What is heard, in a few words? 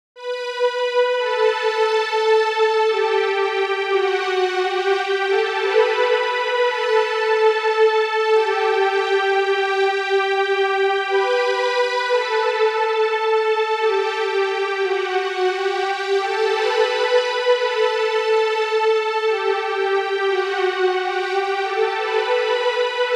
SYNTH